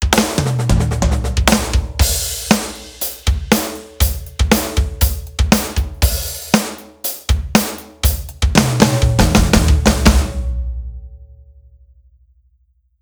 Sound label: trap set drum